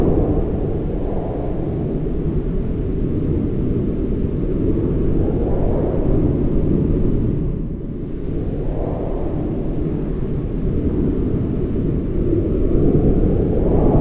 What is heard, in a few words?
MOLOCH moving